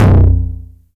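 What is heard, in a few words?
909 low tom, tape saturation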